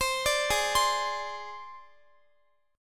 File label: G sharp Major Flat 5th, Guitar; G#Mb5 Chord